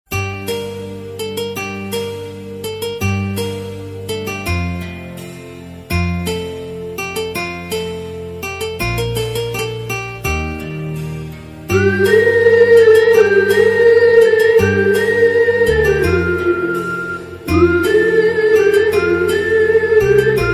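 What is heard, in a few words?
background music Telugu Ringtone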